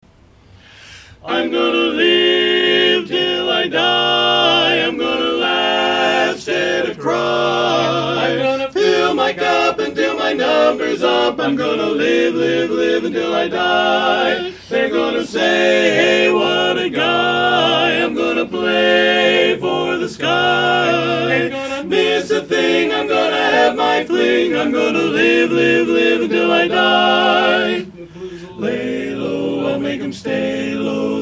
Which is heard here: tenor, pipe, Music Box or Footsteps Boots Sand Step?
tenor